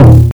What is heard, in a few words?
TOM, TRASH KIT; USB Soundscan vol.11; Drums Dance & Groove; AKAI